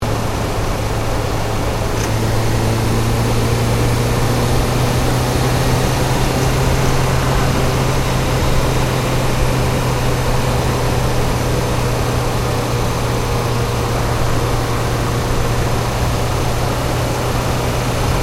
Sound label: Car Ford Focus Cruise Engine Bay; Ford Focus hire car; Vehicles; Cars